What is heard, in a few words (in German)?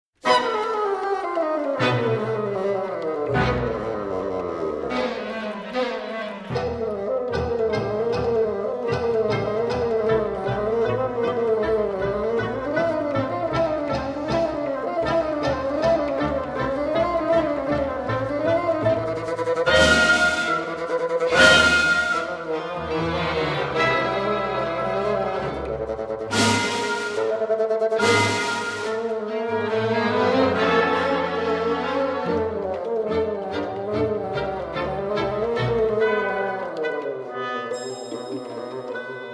Hummelflug Fagottsolo